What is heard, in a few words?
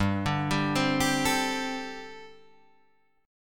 G Suspended 2nd Suspended 4th G, Guitar, Open G; chordshape; down